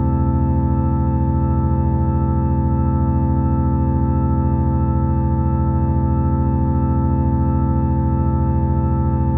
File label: sine multi